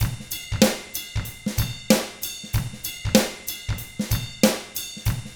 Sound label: 95 FUNK, Drums; ILIO; Double Platinum Drums 1